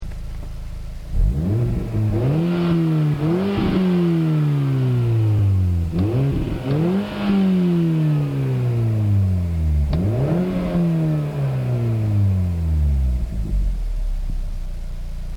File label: Mini Cooper remus exhaust